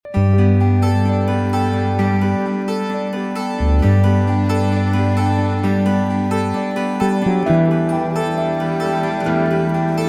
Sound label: Shimmer, 12 string